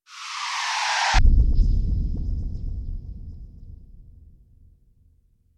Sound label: shoodown